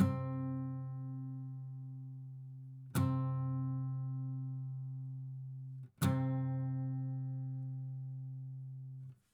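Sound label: acoustic pattern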